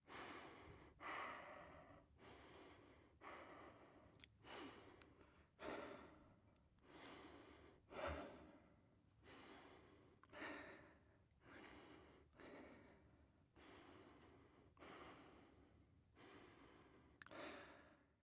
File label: breath ok; Escape from Tarkov